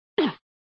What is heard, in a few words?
Sound Hit sound jet bumper; flipper